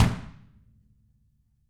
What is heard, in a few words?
REMO KICK